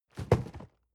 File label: cardboard box medium fall low soft; objekte